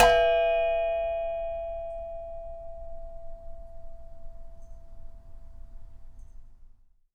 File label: Flick, Japanese Pull Saw